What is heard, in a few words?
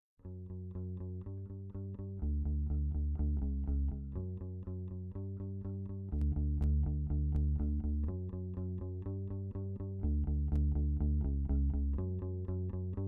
bass